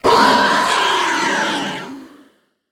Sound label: screech, alien voice